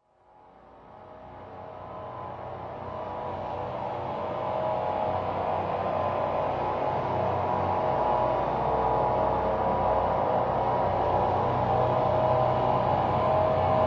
Grainy Drone mystified Remix

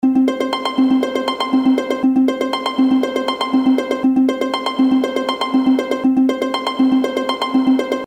Ding A Ling Portamento Pizzi

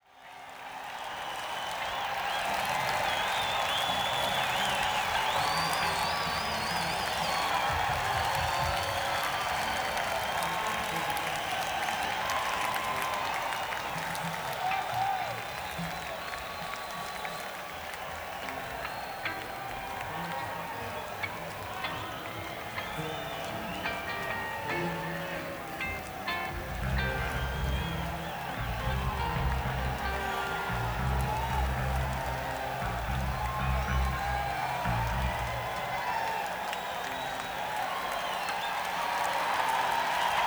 Crowd Tuning; akg451 ck8

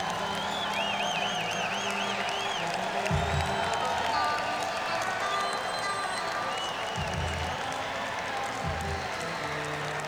Crowd; senn me80

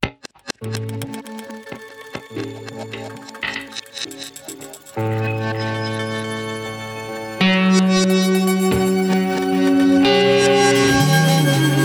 Sparkling Noise; Enko

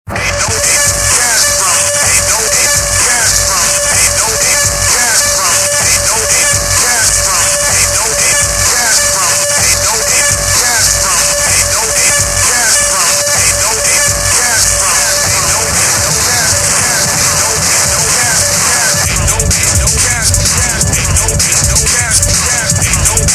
808 cash drum; Venom8888; Destroy Venom8888

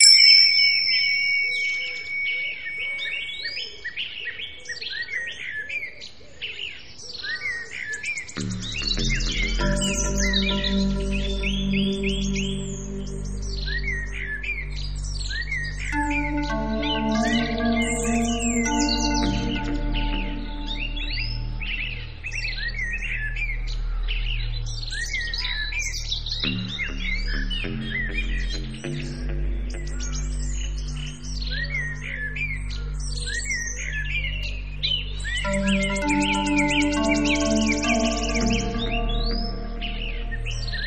birds; naturelax

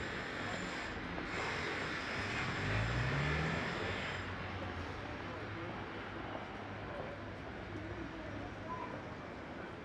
drill kid; Cumbria Remixed Sample Pack

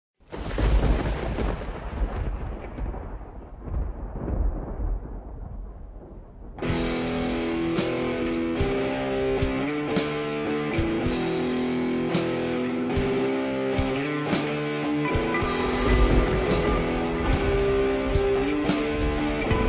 Electric Rain Dance

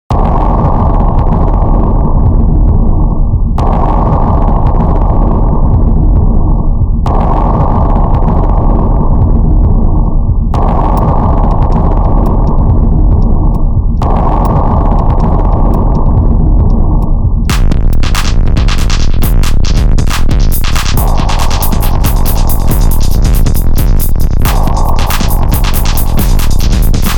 Rythmic

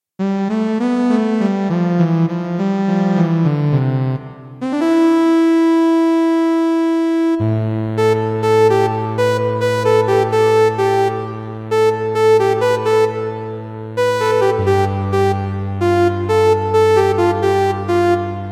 Blow Sax; Kawai K1; Bank 2 card A

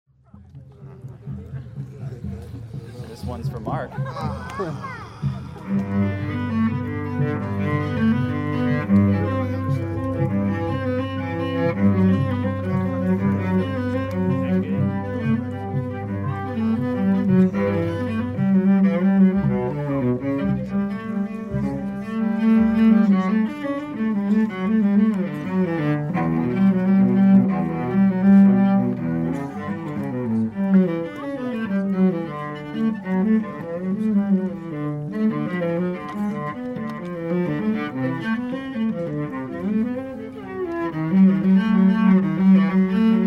cello

